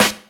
clean steel snare drum sample e key

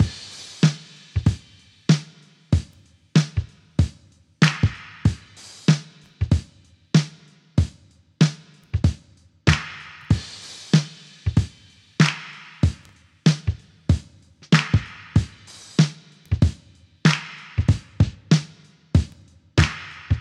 95 bpm drum loop sample g key

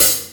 airy sizzle new wave hi hat D key; one shots; hat samples

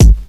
focused kick drum one shot g sharp key

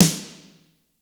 old school juicy steel snare drum f key

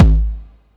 old school deep bass drum sample g sharp key

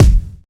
kick e key; tuned drums e key

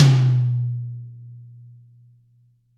long tail reverb tom drum single hit B key

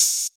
mellow open hi hat one shot g sharp key

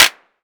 clap one shot e key; percussion; single hits one shots

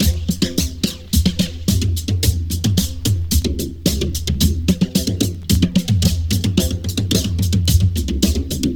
110 bpm drum groove e key

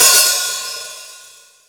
huge hi hat one shot g sharp key

